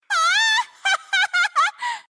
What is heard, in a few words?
laugh; sfv